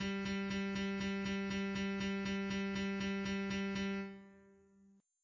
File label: bass 8 beats; orpheus